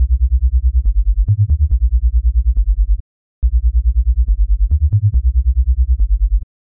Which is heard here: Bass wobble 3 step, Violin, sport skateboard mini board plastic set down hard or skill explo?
Bass wobble 3 step